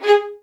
MED ATT G# 1, soft violins; Q Up Arts; Denny Jaeger Master Violin Library

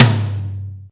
TOM DRY; Back In Time Records; Big Bang

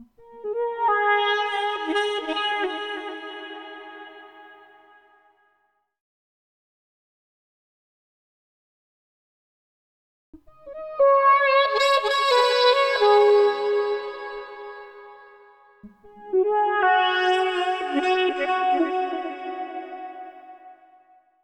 Sax, Brass; Zero-G Smoke

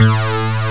DIGITAL BASS, BassGuitar

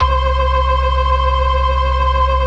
BLOOD OCT R, ORGAN

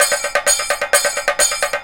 KATAKA, Indian Traditions; USB Soundscan vol.45

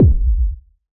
BD, BASS DRUMS; EdgeSounds

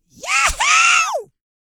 YELL, MALE HUMAN; Spectrasonics Vocal Planet

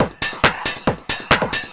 138, Techno drum loops; Techno ID; PS-45

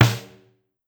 Snare, Pitched Down; Clavia DDrum-AT

